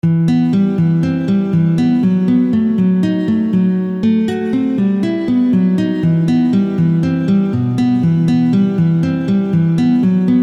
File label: Milonga